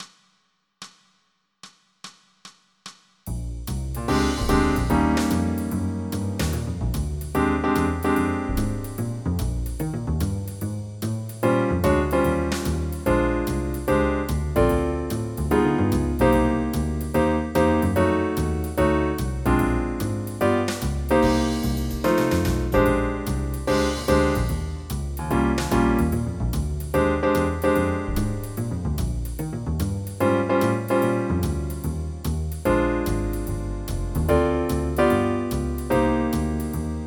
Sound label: blues; tilerama